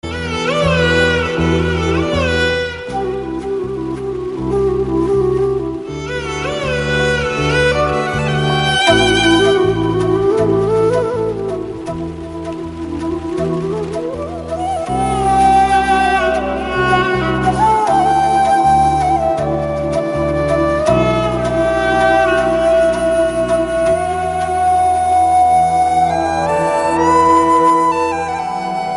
Flute - Bgm

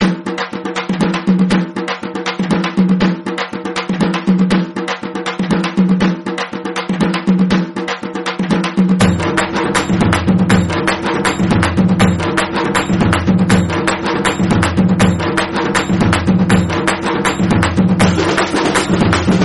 Folk Bgm Instrumental; Petta

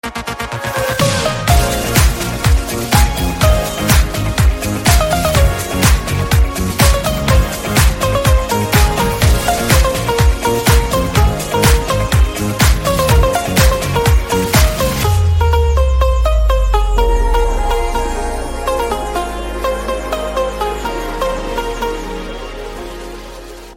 Instrumental

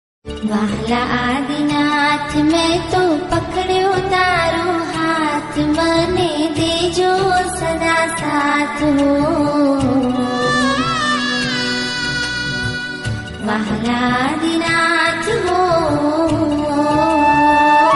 Bhakti; Swaminarayan

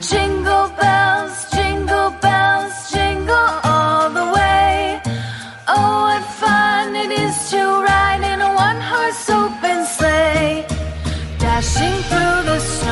Jingle Bells, Female Version

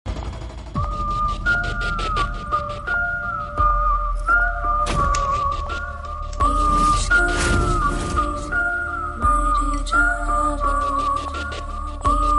Whistle; Ishq Mein Marjawan

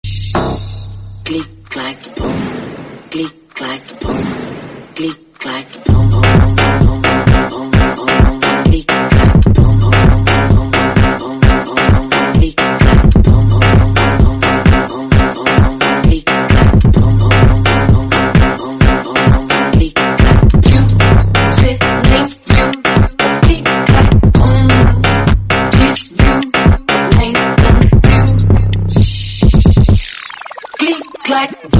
Remix

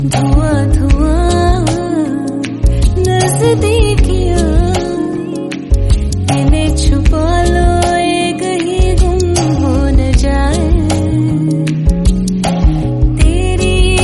Hindi Song

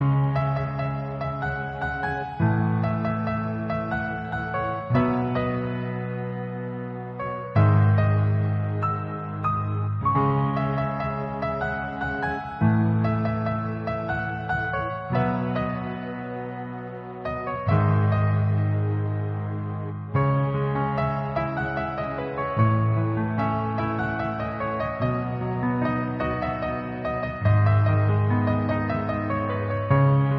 Piano Instrumental

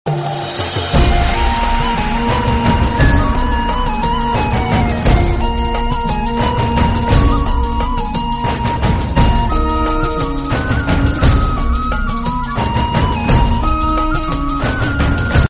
Bgm, Tamil; Aayirathil Oruvan

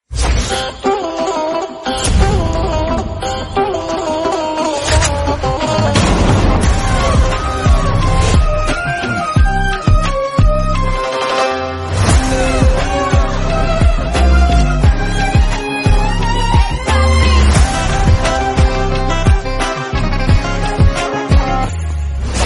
BGM Instrumental

